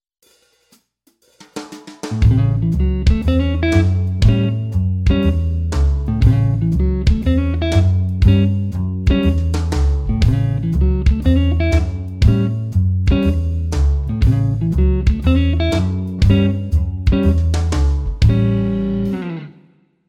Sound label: blues guitar comping